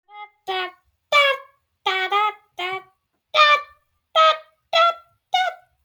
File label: shouting